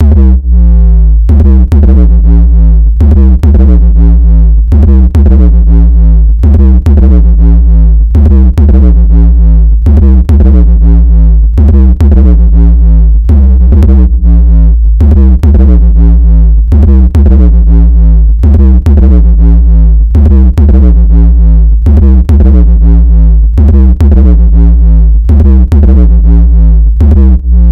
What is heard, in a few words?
Test Beat